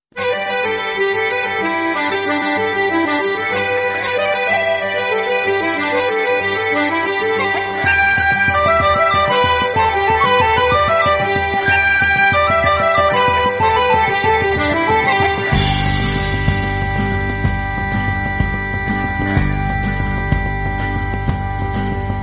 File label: celtic irish